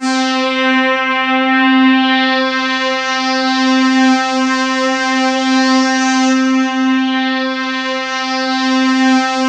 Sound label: SWEEP; 90 s Sample CDs; NiceBeats; SynthLine VOL-2